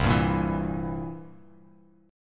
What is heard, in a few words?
C 7th C down, C7; strummed; JGuitar